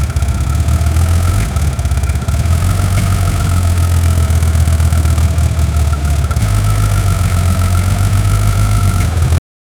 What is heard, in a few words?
v8 car engine at top